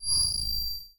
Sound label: buff gained energy pulse